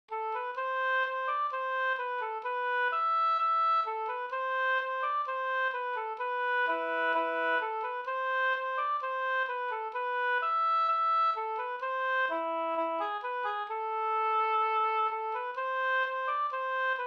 ganglat Fabodlat; Lillhardal Hj

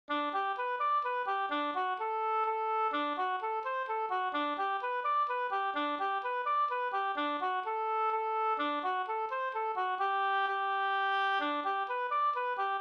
hambopolkett; Gubbstot e Finnmark Hellstrom Og

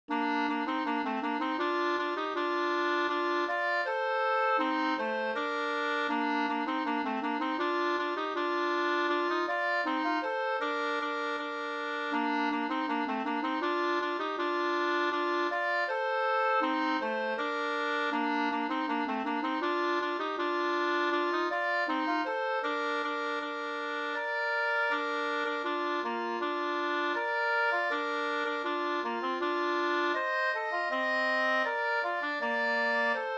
folkdans, Klappdansen; Har kommer vannen Svsangl37

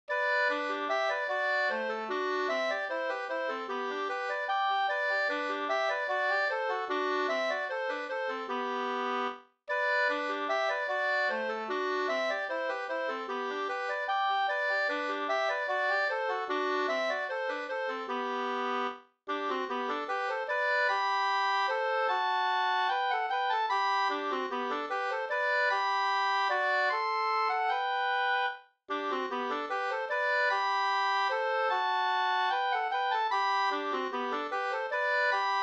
vals G-dur

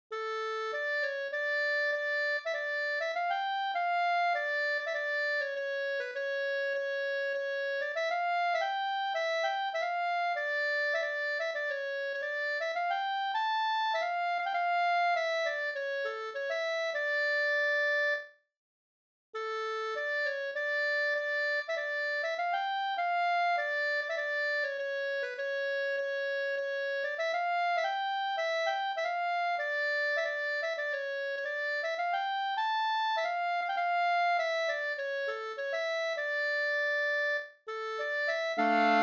brudmarsch Sorglat; fr Transtrand

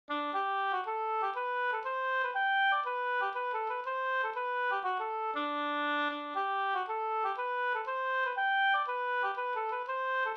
polska Klockarpolska

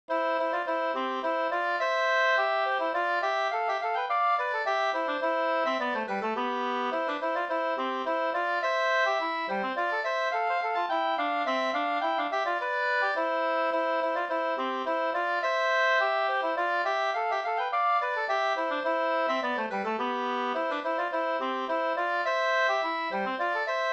slängpolska

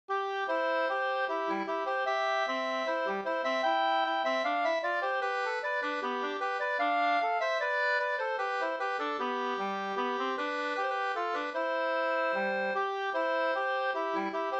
ganglat; Gas-Anders Up

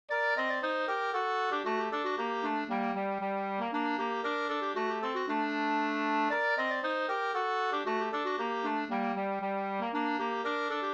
polska polska

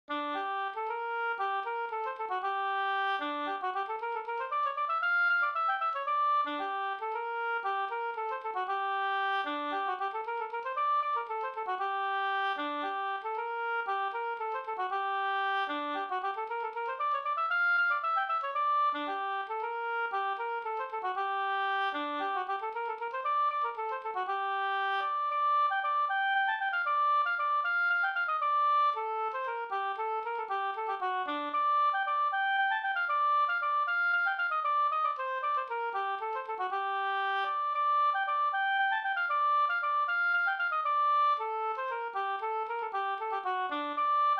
polska g moll

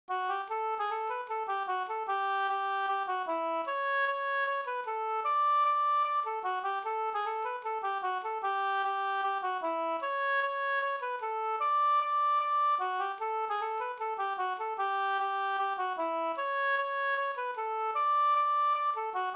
reinlender; e Per Tullut no

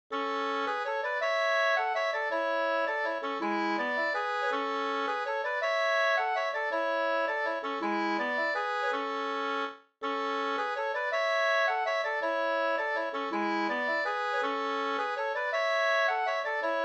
vals

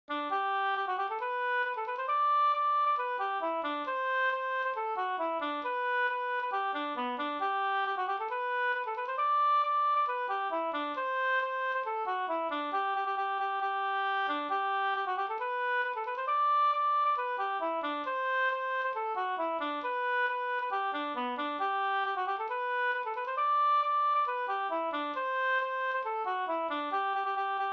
polka galopp; e Hilmar Alexandersen

